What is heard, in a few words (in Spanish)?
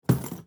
Golpe Mesa